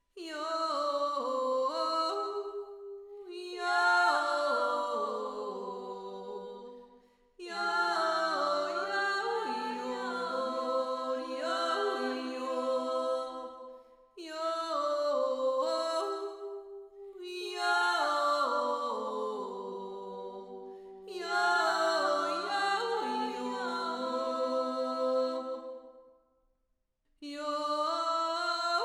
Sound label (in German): berglijuuz alle stimmen